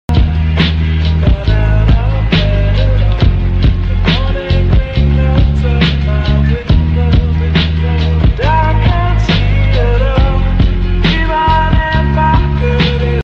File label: sad man sitting at table; audio meme